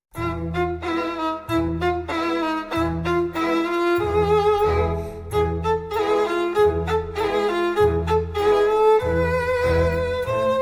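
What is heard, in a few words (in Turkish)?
kasap havası, turkish; istanbul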